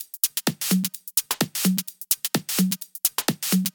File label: Drumloops 128BPM